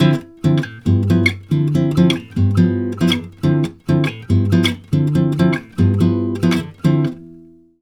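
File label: 140 guitar D minor 7, Brazil Bossa; USB Soundscan; AKAI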